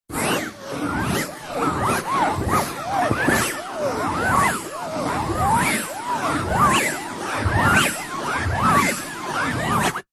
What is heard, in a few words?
foley fabric pointed object scrapes fabric over hard surface whizzing sound; zapsplat